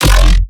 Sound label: bass hit aggro growl F; Virtual Riot